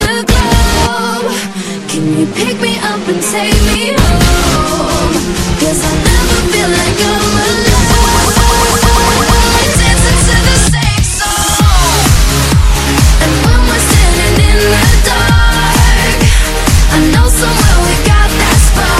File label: australian ringtone